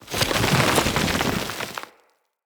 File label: Root Spider Burrow, Foley; Animals